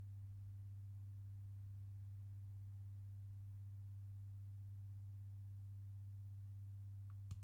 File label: canon